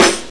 boom bap hip hop snare sound f key, acoustic snare tuned to the F note; snare-drum-samples